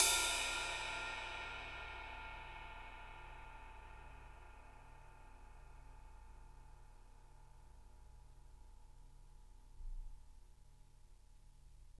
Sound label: RIDE M R; Roland MV8800; RIDE CRASH